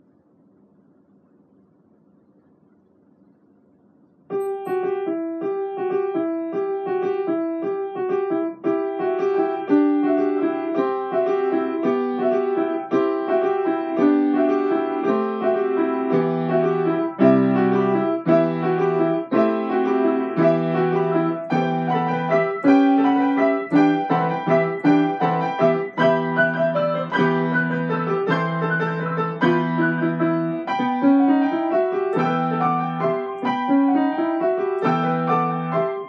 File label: Ukrainian Bell Carol duet; M. Leontovich